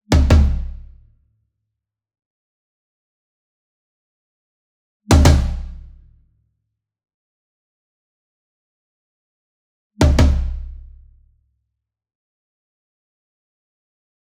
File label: Single Drum Tom Concert Double Hard Beater Near; boom library; Cinematic Strikes Construction Kit